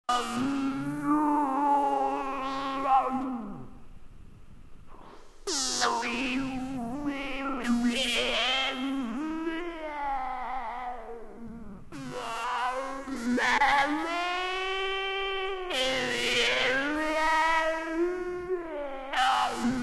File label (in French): chant